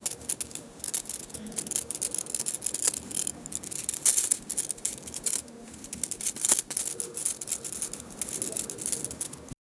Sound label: cash flutter